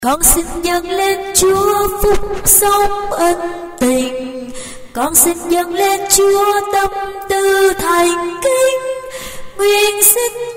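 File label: soprano tenor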